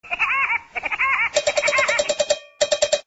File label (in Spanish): pajaro loco